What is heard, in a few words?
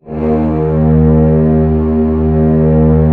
Orchestra D#1 PP, Orchestra; Sound On Sound - Peter Siedleczek's Orchestra